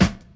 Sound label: natural snare b key; snare drum samples